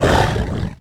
Monster Attack; Monster Ralph

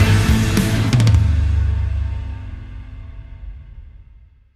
Battle

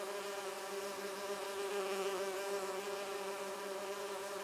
Flies Buzzing; gamerip

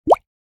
Bubble

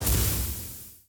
Jump Out Of Snow

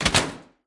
Music Frontend

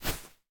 Footstep Beanbag

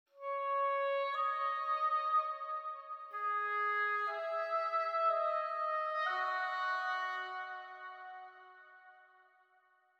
Dialogue Conflict Oboe

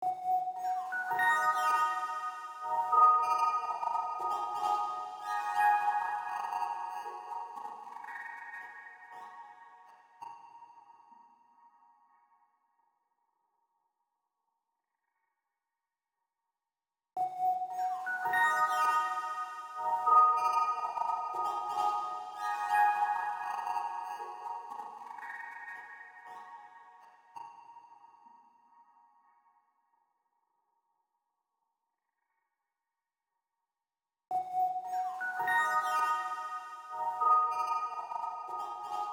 Motif, Rhodes, Glitch

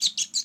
Transformation; gamerip

